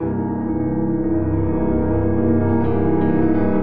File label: Ending Tremolo C Dominant7#9 132bpm, Grand Piano; MIDI Clips; Ableton